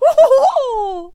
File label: Fencer Attack Vocals